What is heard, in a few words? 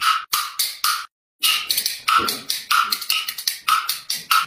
beat